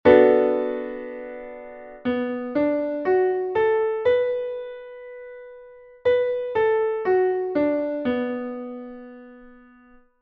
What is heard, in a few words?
supertonic seventh; Essentials of Music Theory: Elementary